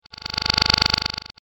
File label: Fast Whoosh Tremble Effect; Whoosh Sound Effects